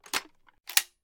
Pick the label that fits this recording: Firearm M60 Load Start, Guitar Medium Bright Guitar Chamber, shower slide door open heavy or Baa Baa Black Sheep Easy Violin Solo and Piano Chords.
Firearm M60 Load Start